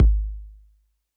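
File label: Bass Drum Analog H; Yamaha DJX-2B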